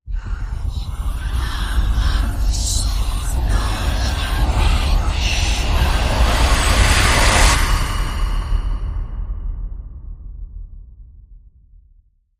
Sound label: Vengeful Whispers Demon Rise